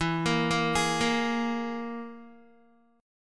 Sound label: E Major Flat 5th E down Guitar; arpeggiated; 4F,4A,5C,5G,5C,6E